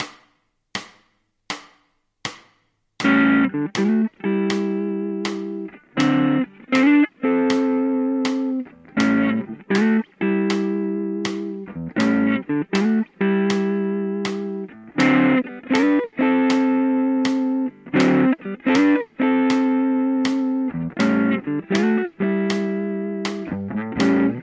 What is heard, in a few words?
blues, ritmos de blues